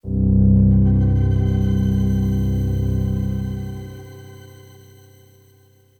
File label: SYNTH PAD AMBNT 160 C, Ambient; eJay; Sphere